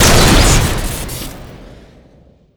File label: recoilless shoot crit, weapons; tf2classic